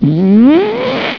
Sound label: fart